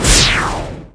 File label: air booster; gameplay